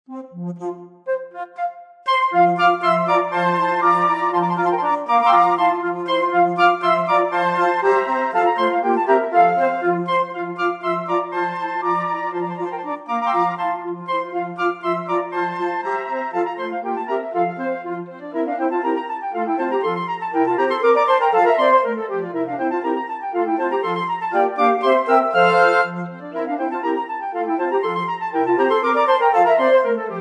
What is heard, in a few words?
Tambourin; Hasse